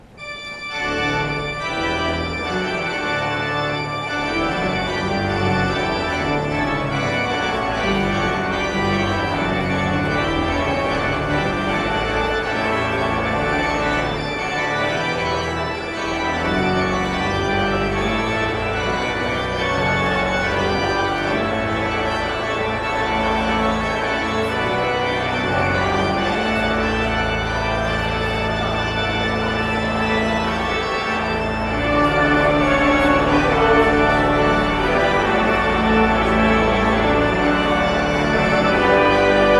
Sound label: Postlude